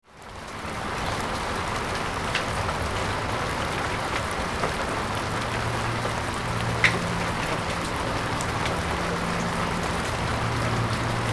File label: Rain Sounds Rain While Driving